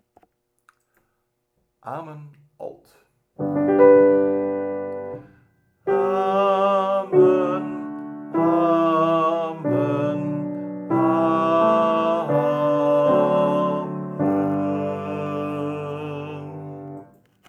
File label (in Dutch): Amen alt, vierstemmig